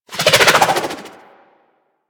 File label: SMG Projectile By; Sundial